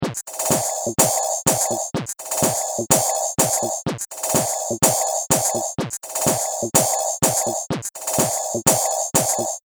Techno Beats 125 Bpm; Music Loop Sound Effect - Percussive And Rhythmic; Seamless loop

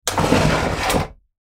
Chair Slide Tile Floor; Foley; Offices; Blastwave

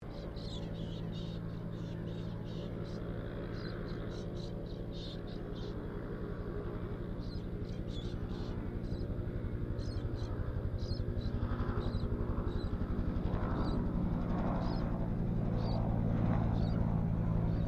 HELICOPTER BELL UH1 IROQUIOS TAKEOFF DISTANT; RAN UH-1 Iroguios; Helicopters (Sound Effects:Vehicles)

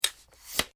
Tri-Pod Leg Extension Foley; Scrape; Scrapes

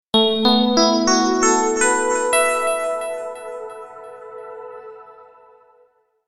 Startup, Computer: Short Musical Style Phrase; Miscellaneous Musical Sound Effects; Miscellaneous Musical (Sound Effects:Musical); Stuart Duffield

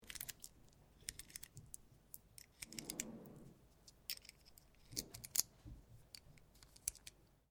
RIFLE ANSCHUTZ 67MSR 22 CALIBRE MAGAZINE LOAD; A selection of domesttic firearms; Rifles; Guns

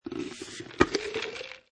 Drink Open, Soft drink cup plastic lid open; Miscellaneous Human Sound Effects

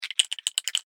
What Is Shaking, cartoon; Miscellaneous Cartoon Sound Effects

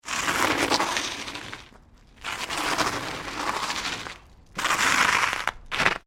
Pushbike Wheel Skids, Tyre skids on tarmac road; Bicycle; Bicycles; Vehicles

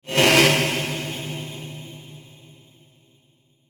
Sweeper Dirty Whoosh; Production Element Imaging Element Accent Transition

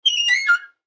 Ship Morse Code Spotlight Exterior Turn Squeak; Transportation Watercraft; Boat; Boat Sound Effects; Blastwave

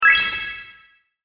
Retro Video Game Spell; magic; with delay; Magic Sound Effect

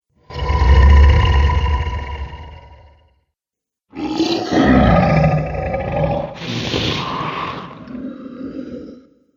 Dragons, monster; horror

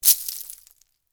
Debris Drop Penne, Rigate; Texture; Materials; BlastWave

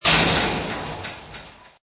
Metal Fence bang; Metallic; Metal sound; Sound Effects:Multimedia; Lynne Music

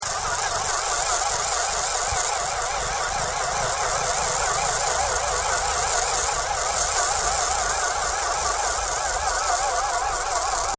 SCI FI MECHANICAL LOOP; Various Sci Fi vehicle effects; Spacecrafts (Sound Effects:Science Fiction)

